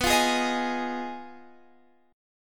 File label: F Minor 7th Flat 5th B